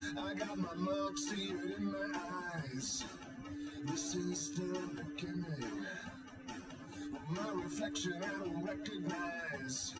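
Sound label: with drums, live rec, 250ms, 1m; SiSEC08; SiSEC underdetermined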